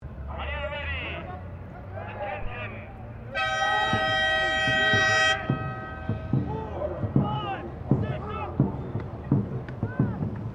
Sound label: Boats Dragon Boat Race; Dragon boat racing; Boats (Sound Effects:Vehicles)